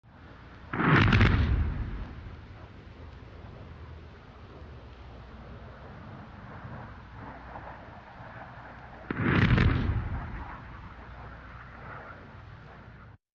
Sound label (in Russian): Dva vzryva na zemle bombezhka; Звук взрыва от бомбы или снаряда